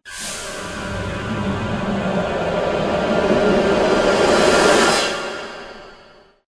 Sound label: stinger song, music stingers; hl2